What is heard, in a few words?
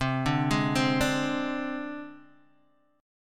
C Suspended 2nd Sharp 5th, down, Guitar, Open D